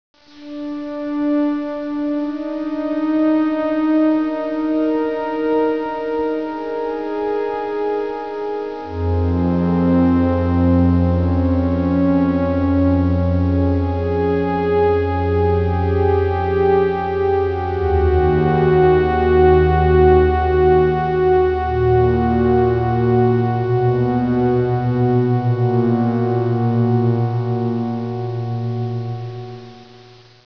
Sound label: Sound Music